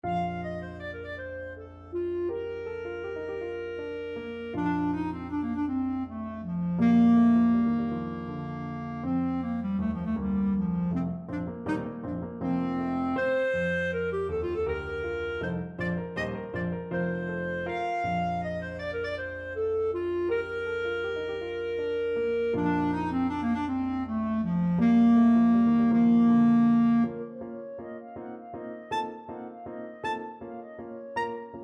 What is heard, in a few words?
clarinet; grieg norwegian